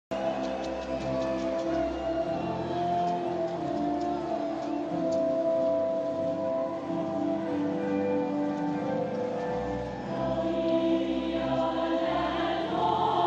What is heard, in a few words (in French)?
hymne national namibien